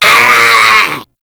Infested Runner Attack Call; Warframe; Runners

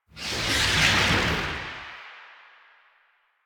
Bullet Jump Whoosh, Motorcycle; Motorcycle Ninety Nine

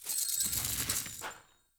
Unearth A; Encounters; Treasure Hunt; Sproutfolk

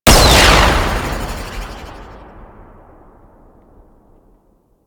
Infested Golem Beam Fire; Warframe

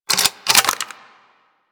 Sniper Reload; Warframe; Tenno

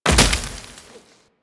Land Heavy Veg, Footsteps; Warframe; Tenno

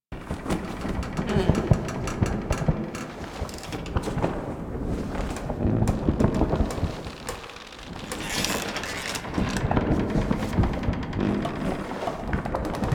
tent loop; Ambience; Props; Warframe; Duviri

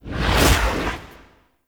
Spirit Proj Whizz By; Enemy; Mockeries; Abilities; Sub Boss; Mockery

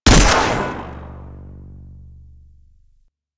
Electro Mag Charged Shot, Weapons; Warframe; Corpus

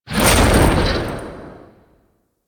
Ghost Teleport In; Warframe; Priest Quest; Rell Ghost

